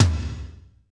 TOM, Roland Kits; Northstar - Drumscapes Roland

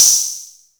HH SYN3O, Korg Electribe EMX-1

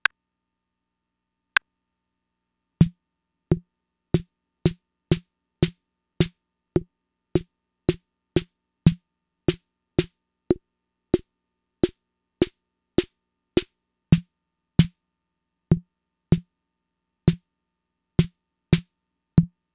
MFB-522 SNARES; Fricke

